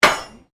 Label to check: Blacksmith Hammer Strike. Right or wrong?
Right